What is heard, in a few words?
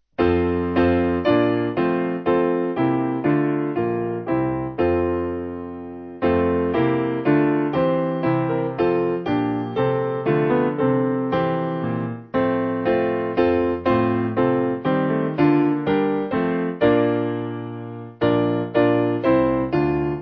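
Piano, Music Backing; Stars Of The Morning; Trisagion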